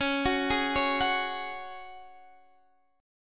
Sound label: C sharp Suspended 4th, Guitar, Drop D